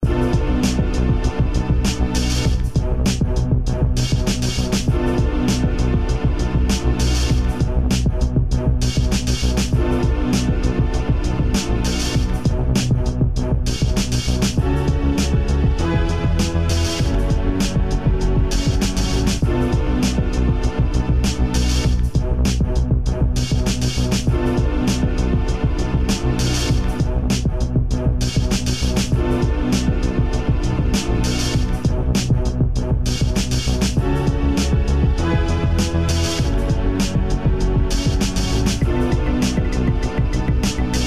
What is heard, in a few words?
dark trance; SilverStudioGames